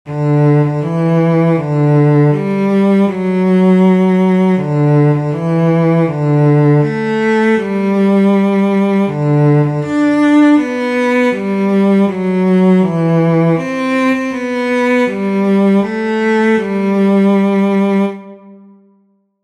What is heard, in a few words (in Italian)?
violoncello tanti auguri Violoncello; Spartito per violoncello di Tanti Auguri a Te, con accompagnamento o accordi